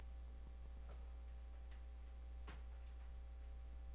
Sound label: gun